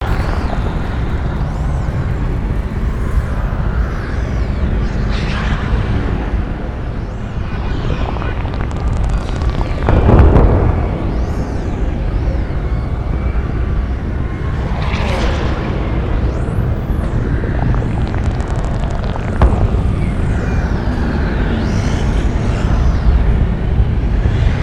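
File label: Background US West Industrial; Transformers: Revenge of the Fallen; PS3; Windows; Xbox 360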